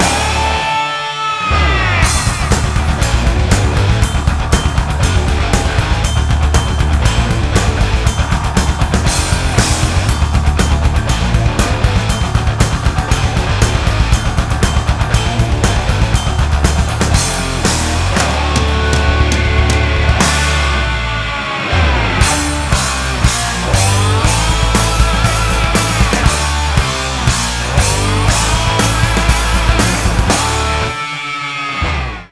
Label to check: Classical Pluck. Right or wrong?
Right